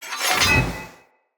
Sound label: Sword Whoosh Accent Heavy; Weapons; Swords; Fenn Jotar Sword